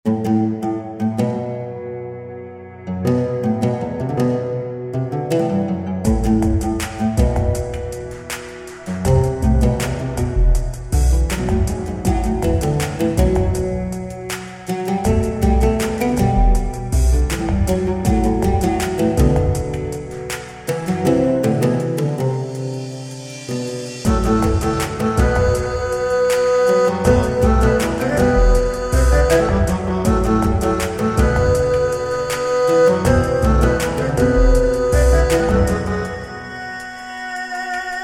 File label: music